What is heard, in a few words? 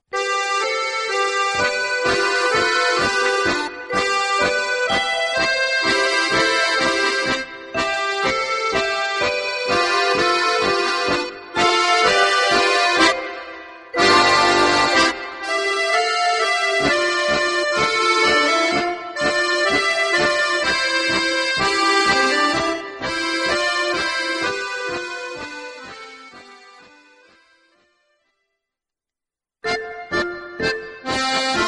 piccolo tango